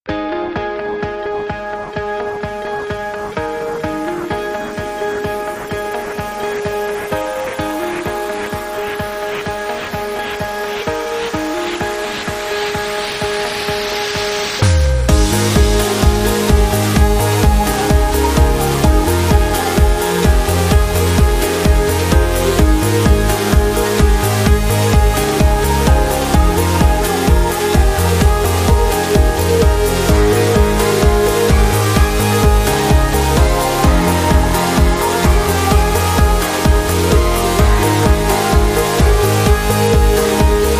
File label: No Vocals